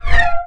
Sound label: lamp appear